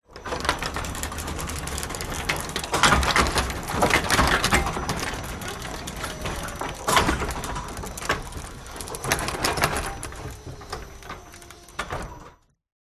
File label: household garage door wooden electric motorised open or close; zapsplat